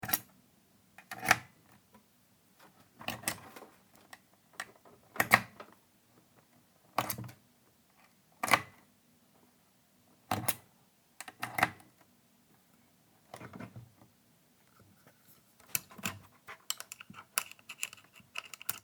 Ringordner Verschluss

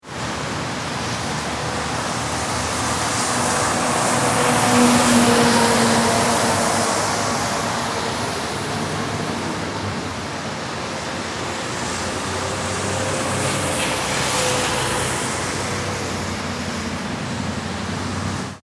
Verkehr 4 spurige Strasse

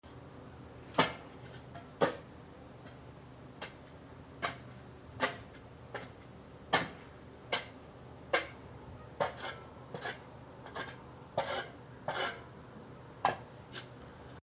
Schaufel

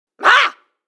schreien weiblich

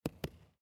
Judo Matte

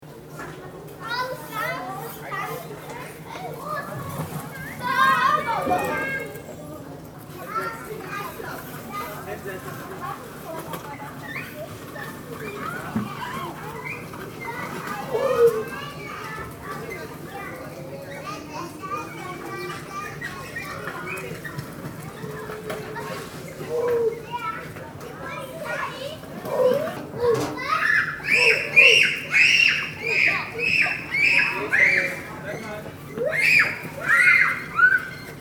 spielende Kinder

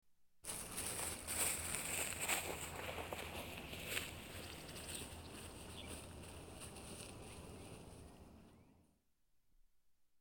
Durch Gras gehen; Hausarbeiten Heimwerken

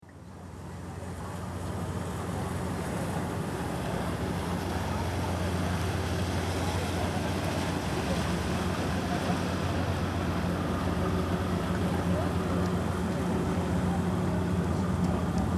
Motorboot

